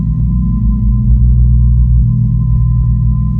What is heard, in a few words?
alien bridge; quake2; zepsounds